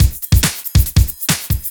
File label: Loops BB 140, BreakBeats; Vengeance Samples